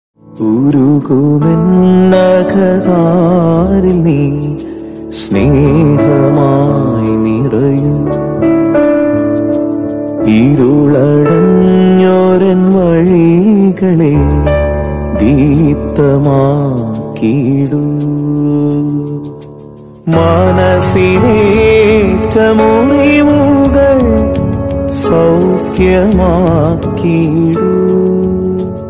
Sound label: malayala christian devotional; malayala christian status